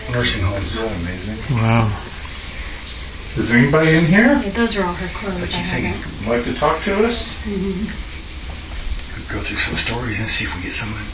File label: whispering throughout